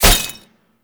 Tank Weakpoint Impact; Enemies; Gameplay; Lasrian Tank; Warframe

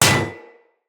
Bullet Impact Generic Enemy Shield; Weapons; Surface

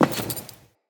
Walk Metal, Footsteps; Enemy; Characters; Lady Deora

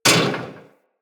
Bullet Impact Debris Pipe; Weapons

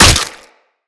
Barrage Hit Flesh; Warframes; Berserker

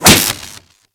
Whip Impact Default; Warframe; Weapons; Corpus; Impacts

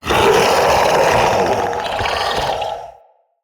Male Vocal Breath Heavy, Enemy; Mockery; Mockeries

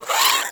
Vocal Attack, Hunting Critters; Nexifera

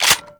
Heavy Shotgun Cock Start; Warframe; Tenno

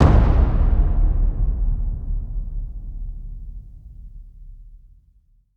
Taiko Drum; Warframe; Dojo Props; Taiko Drums

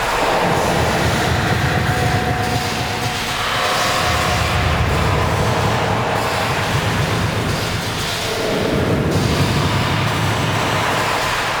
Void Dragon Floor Lava Pillar Loop; Enemies; Gameplay; Warframe; Duviri

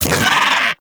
InfKDriveBug Vocal Pain; Warframe; Hunting Critters

